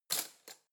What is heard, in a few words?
cutlery spoon drawer place